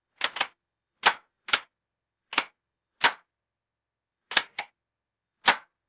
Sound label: metallic switch toggled on and off; mechanical